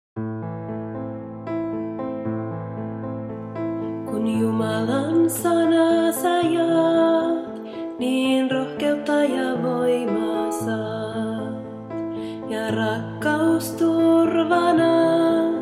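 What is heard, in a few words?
Song, Finnish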